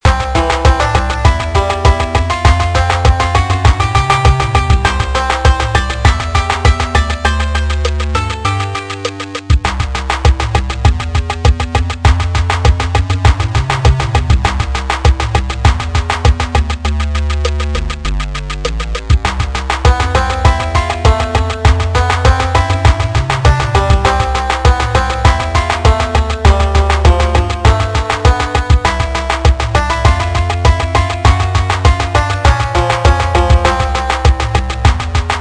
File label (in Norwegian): banjo ekkospesial